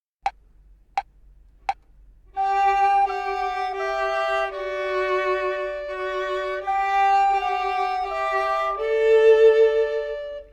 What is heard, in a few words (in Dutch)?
Ons eerste trio